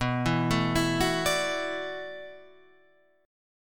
E 7th Suspended 2nd Suspended 4th, B

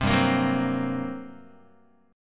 G Minor Major 7th Double Flat 5th, G, Guitar; strummed; JGuitar

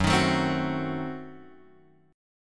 F sharp Diminished 7th F sharp Guitar Open F; strummed; x,1,3,4,3,1

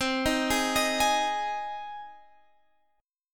C Minor Sharp 5th C Guitar Half Step Down

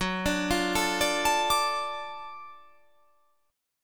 F sharp Minor Major 7th Sharp 5th, guitar

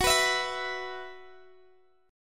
Gb 7th Suspended 4th Gb, Guitar Open G; chordshape; down

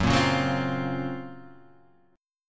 F sharp Major Sharp 11th F sharp; x,x,4,3,1,1,2,2; strummed

